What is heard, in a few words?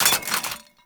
Grenade Launcher Mag Drop; Weapons; Scaldra